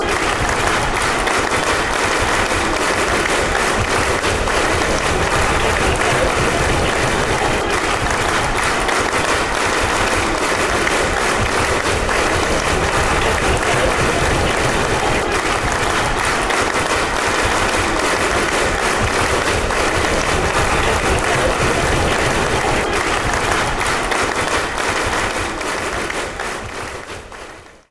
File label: clapping; lfc